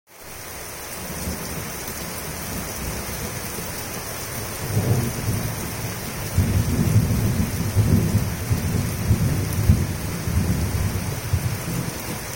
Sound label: rain and thunder